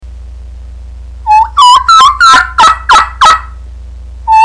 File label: Turkey Kee Kee Run